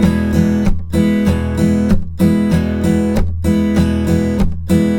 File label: riff 96 E, Blues Guitar; ILIO; Fretworks